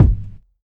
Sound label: rich lows kickdrum sample g key